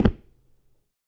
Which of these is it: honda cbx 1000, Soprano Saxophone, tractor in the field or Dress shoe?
Dress shoe